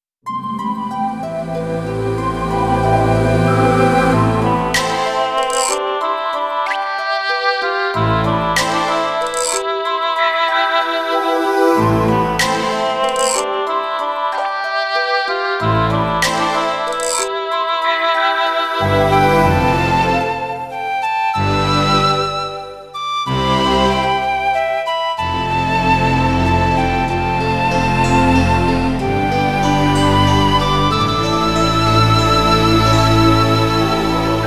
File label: Rain Drop Waltz; atelier iris eternal mana original soundtrack